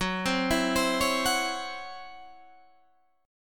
C sharp Minor Major 7th Double Flat 5th F sharp, guitar chord